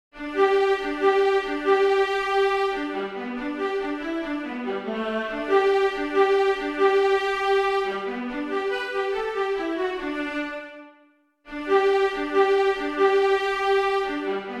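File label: Schumann Little Hunting Song Viola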